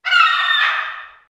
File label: bird